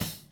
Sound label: Hat